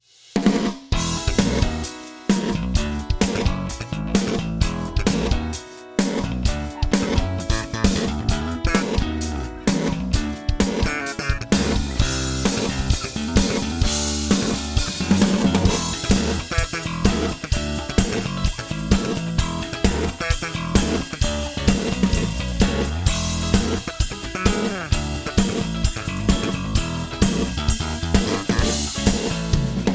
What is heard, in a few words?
Ensoniq DP Pro Drums Band Non Lin Drums